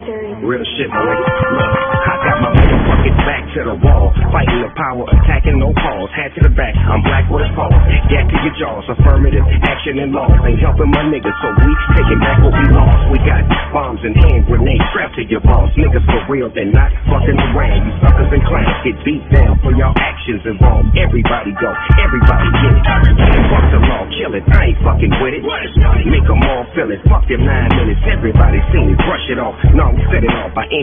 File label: Game Over